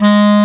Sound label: clarinet G#2; InVision Interactive; Lightware VOL-1; Instruments & Percussions